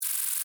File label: Alien Insect Move, Scifi, Alien, Insect, Movement, Shake, Rattle, Buzz, Science, Fiction